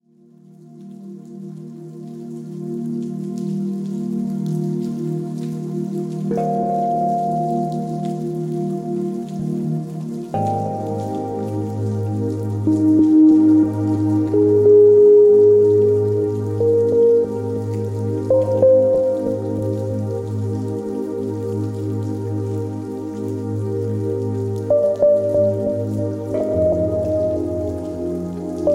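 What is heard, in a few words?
shum morya, nature sounds for babies; rebenok i more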